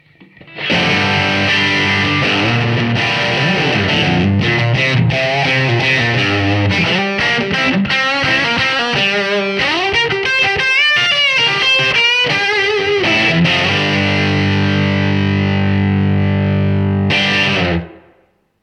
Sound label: tele bridge; MACBROS